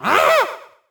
gobbo pain; Fight Knight

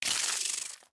Spider Attack; The Inn

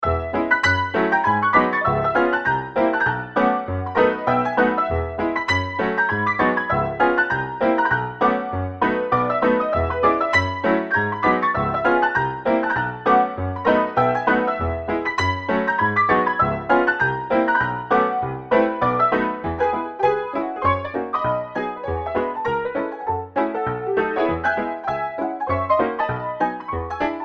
Ragtime Tutti; Indoors

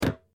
Place Planka; Granny 3; Android

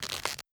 wood stick sway

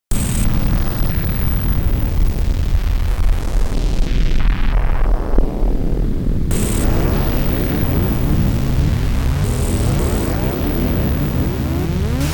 Big Explosion; Retaliator; msx2

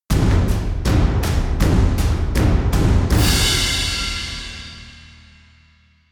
Combat Out; Novigrad; The Witcher 3: Wild Hunt

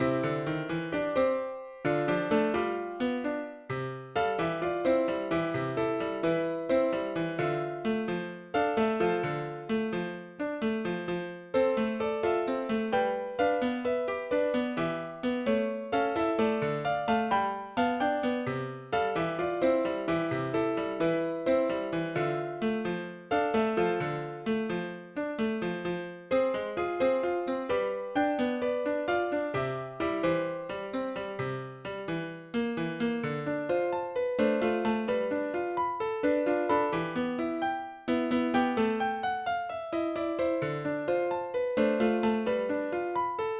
Piano town; Splendid Piano

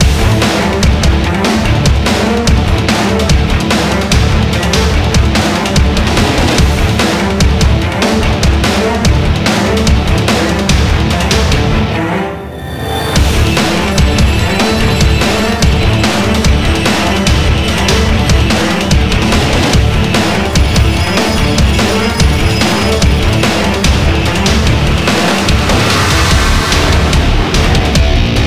Hammerstrike Fort High Action; modern combat 4 zero hour; android

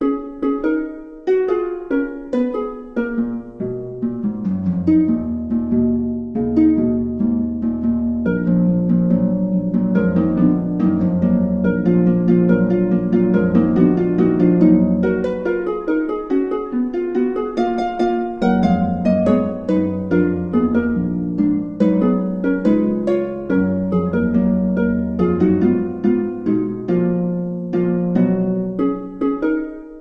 Harp; Badh'r Kilnfest

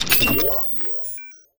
solve puzzle

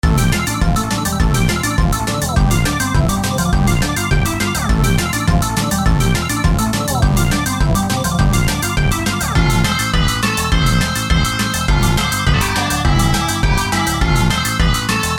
Epic Dance Music; ultra custom night campaign mode windows gamerip 2022

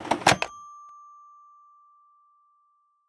open lock

